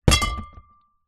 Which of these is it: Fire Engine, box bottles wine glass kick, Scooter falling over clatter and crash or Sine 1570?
box bottles wine glass kick